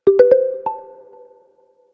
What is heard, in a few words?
Flick; IUNI; IUNI OS 3